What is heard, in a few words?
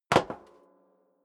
step sneaker metal rattle stair down; schritte